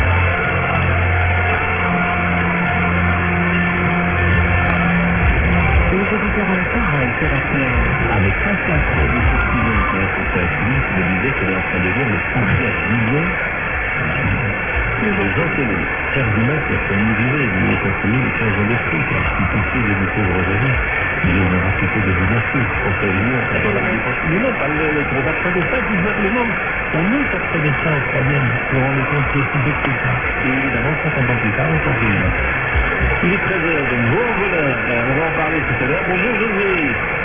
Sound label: websdr RTL Test 234.0kHz; RTL auf 234 kHz